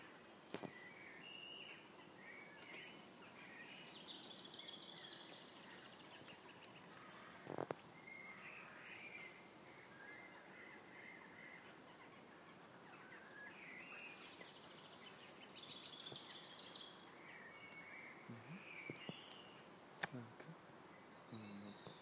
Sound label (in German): Großer Abendsegler